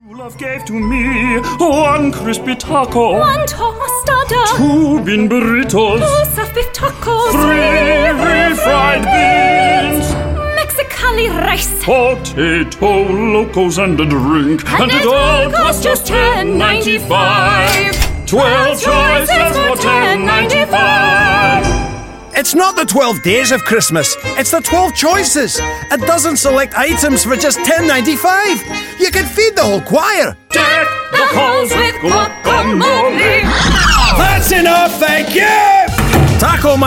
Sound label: Jingle; Taco Mayo